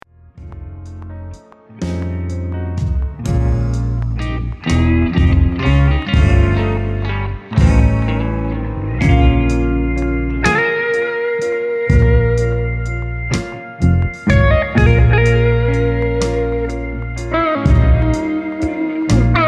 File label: Blues